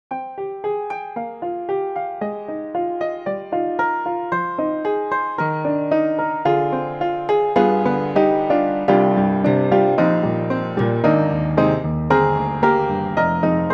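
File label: Antique Upright, piano; IK Multimedia; Sonik Synth 2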